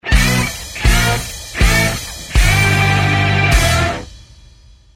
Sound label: sting; disneyxd; stuntastic